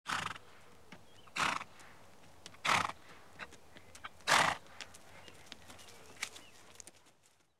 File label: Horse Approaches Snorting; Animals and Birds; BBC Sound Effects Library